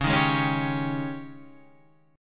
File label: Ab Major 7th Suspended 4th Db